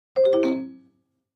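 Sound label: Clavichord Short Descending; keyboards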